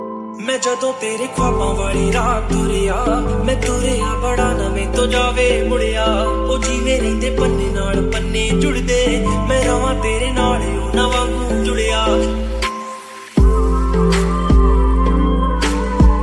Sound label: remix dj ringtone